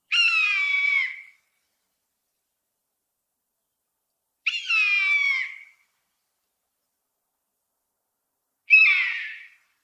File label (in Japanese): nosuri